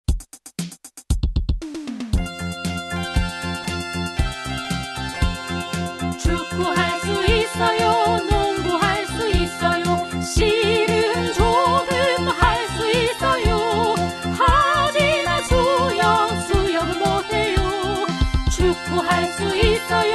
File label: korean sport song; arirang